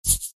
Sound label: editor Erase